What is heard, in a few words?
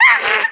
ukkiki